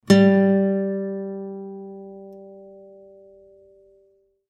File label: String (Sol G), guitar